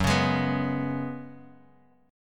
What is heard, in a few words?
F sharp Suspended 2nd Suspended 4th, Bass Standard; strummed; JGuitar